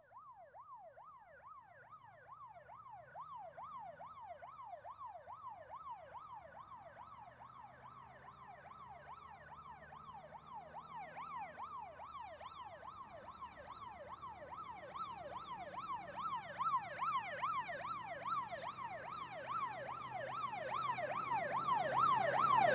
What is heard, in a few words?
Fire Engine, Pass L To R With Yel; Emergency; BBC Sound Effects Library